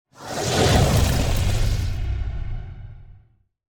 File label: Rock Throw Impact FLAME; Evolve; Meteor Goliath